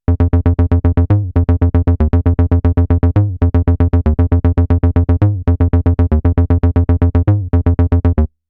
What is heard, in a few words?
Slide